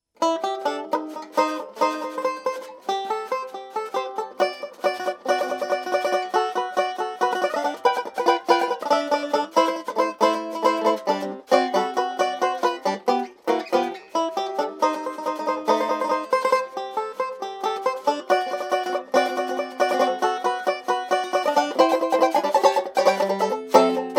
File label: tenor fall, banjos